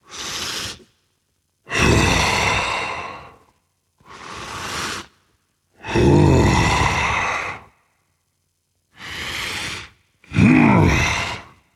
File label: breath rage; chaac